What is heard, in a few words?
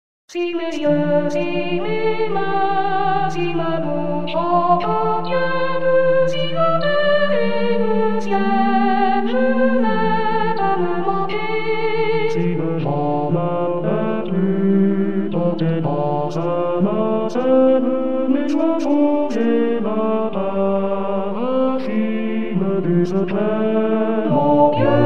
Tutti